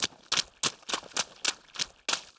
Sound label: shallows run